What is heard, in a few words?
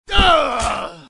hit